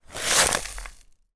food stackable potato chips in tube tilt movement crumbs; pringles